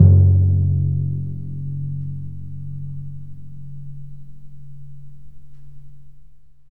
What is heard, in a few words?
DRM, Orchestral Perc; Roland LCDP03